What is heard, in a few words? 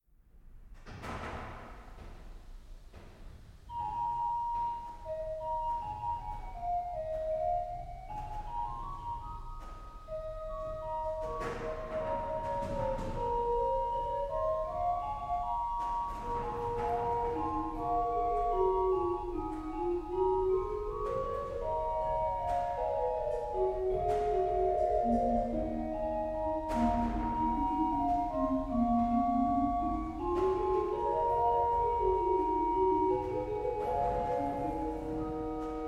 Canzona in d, organy; Oginska